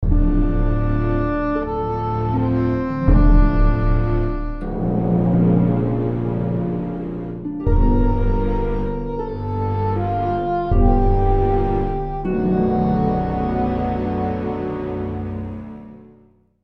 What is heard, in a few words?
Jingle Negative; The Guild 2 Renaissance